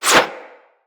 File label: Fire Arrow Whiz By; Level Events; Midrath; Warsong Prologue